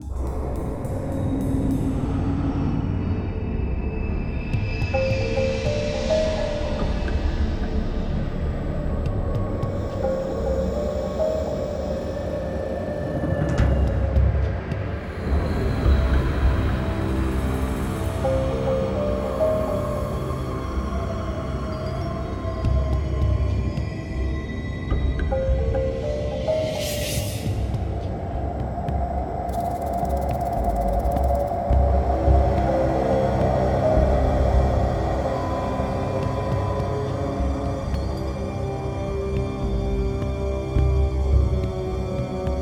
Ambient Horrorble

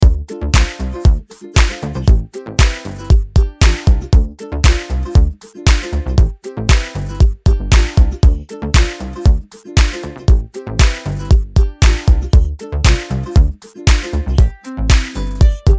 Forest Music; Floogen

